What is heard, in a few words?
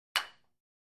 Free Weights Exerci